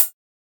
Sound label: Hihat; Ghosthack Advent Calendar Day 4